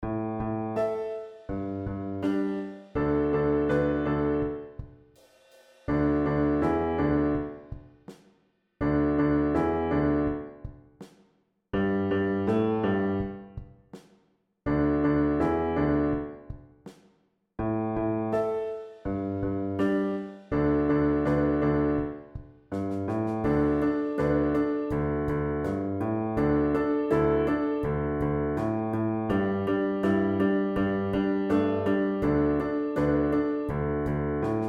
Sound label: first finger rock accomp